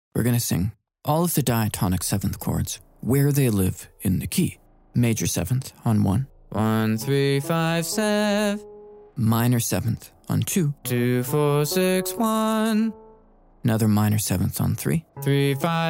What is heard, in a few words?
Vocal Diatonic Sevenths Demonstration